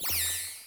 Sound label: MOVE; C64 Dreams; Multi-Sound Default